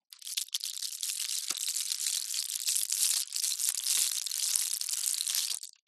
household medicine tables blister pack large many handling